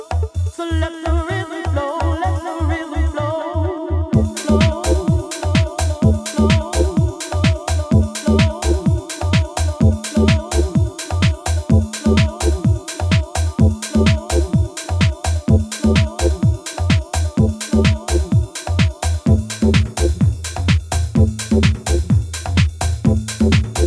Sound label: Bass - Rhythms